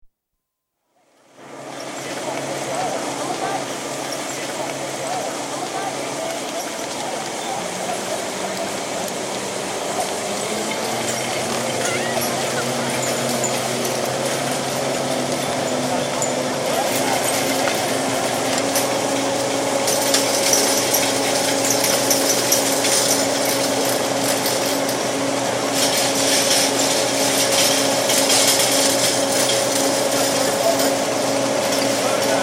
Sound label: Skiing, atmosphere by chairlift with faint chatter, lift starts up, runs & stops; Skiing And Snowboarding; BBC